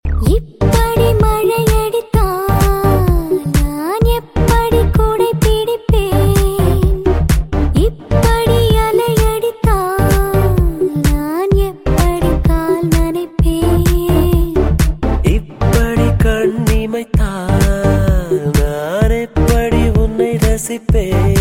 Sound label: Love Tone